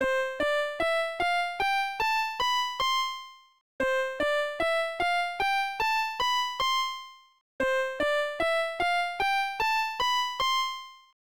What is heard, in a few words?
Speaker Check; namco system 11; Tekken 2